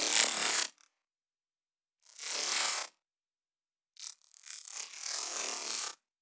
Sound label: granular foley z